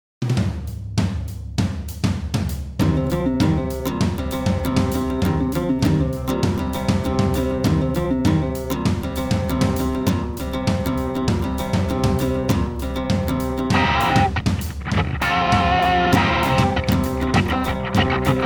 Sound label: Classroom Transition Accoustic Mix